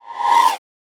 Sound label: horror ghost scream; soundscrate